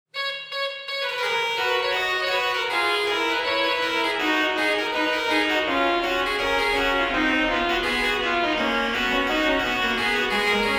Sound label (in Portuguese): Orgao Arouca; PureSound